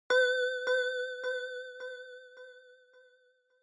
Single Accent High Delay

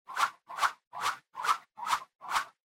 Motion Swish High Set

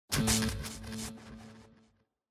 Horror Accent, Short Delay

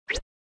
OS Sound Notification

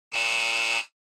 Electromagnetic Buzzer, Dry

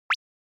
Anime Motion Single

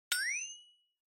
Flexatone

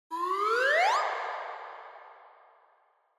Slide Whistle Reverb

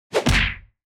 Hit Punch Low

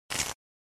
Horror Accent Short

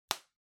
Bubble Wrap (Pop Close); 気泡緩衝材; プチッ 近い; 梱包材

